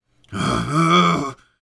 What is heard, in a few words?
Grunt